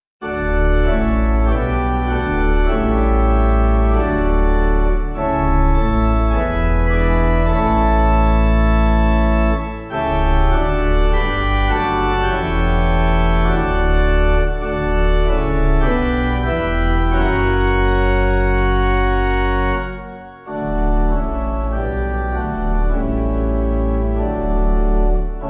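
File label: Pipe, Pipe Organ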